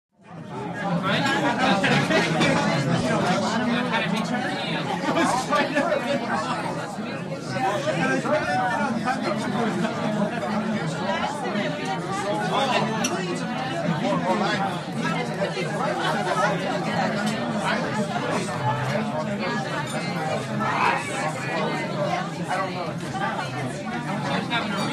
RESTAURANT Background CHATTER: Busy restaurant, lively American chatter.; restaurants & cafes